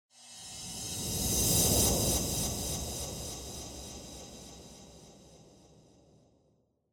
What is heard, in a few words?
Scene Flashback Long